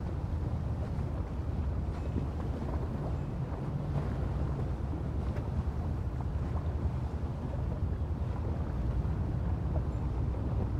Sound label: calm measured soothing sound of the sea